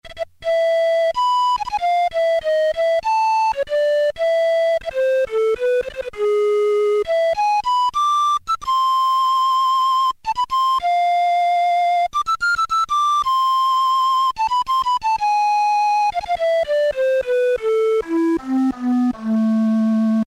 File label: panflute, Technics SX-K450